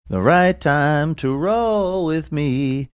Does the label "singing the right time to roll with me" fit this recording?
Yes